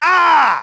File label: pain, grunt; nimble grunt